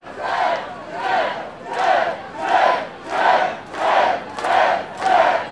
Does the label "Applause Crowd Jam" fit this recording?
Yes